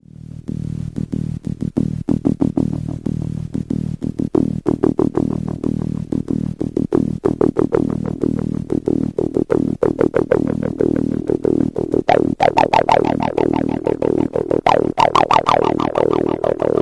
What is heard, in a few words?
tb303 dco